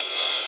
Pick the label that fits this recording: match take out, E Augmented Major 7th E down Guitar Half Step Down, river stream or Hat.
Hat